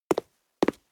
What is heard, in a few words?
Footsteps Rock; Idle Champions of the Forgotten Realms